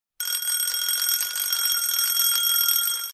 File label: alarm